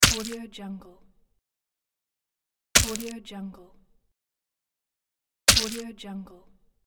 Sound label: knife stab